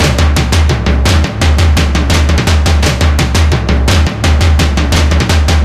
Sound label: drums; Left 4 Dead 2; Source Engine; video games; zombat; horde